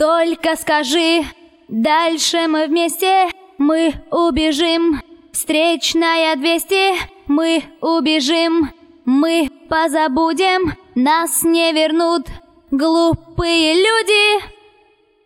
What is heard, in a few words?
VOX Couplet MIXED, acapellas